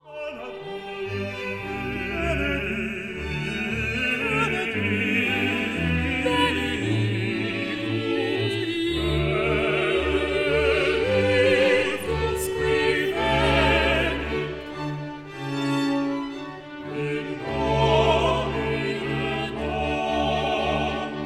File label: Benedictus Quartet